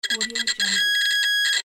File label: glitch